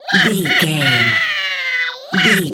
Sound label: goblin fairy laugh angry